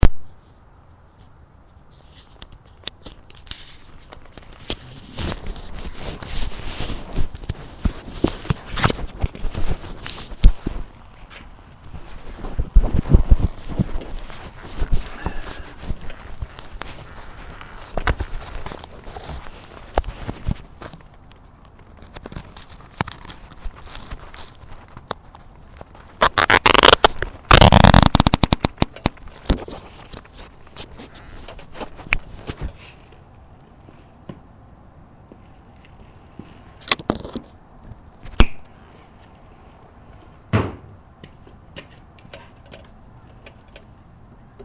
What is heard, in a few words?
Whats That Noise Ride, klr650; what is this noise